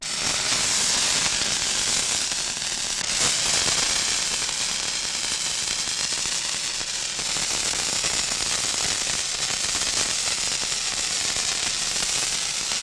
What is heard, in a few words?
Fuse Sizzle; Weapons & Explosions; Sony Pictures Sound Effects Series Volumes 1-10; Sony Creative Software